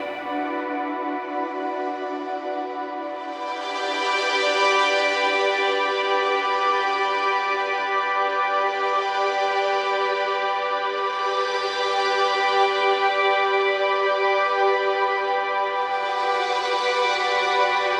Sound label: Bm Synth Drone Move; Ambient Textures; Instrument Drones